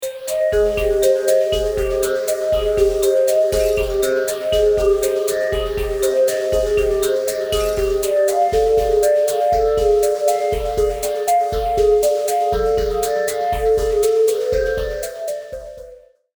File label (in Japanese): ミステリー・ホラー・探索パート｜不穏な感じムンムン